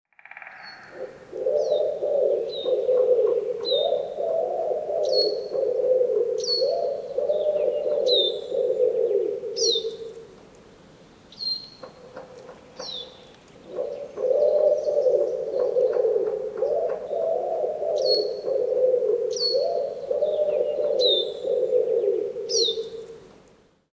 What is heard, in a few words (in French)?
Pigeon ramier; sonotheque ornithologie